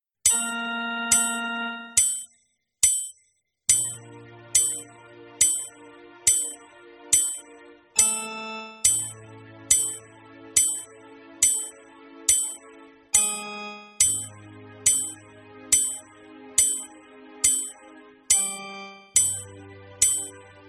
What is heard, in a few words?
warm up exercises